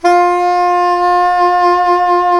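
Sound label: saxophone soprano mezzo forte; Roland - LA Composer vol. 1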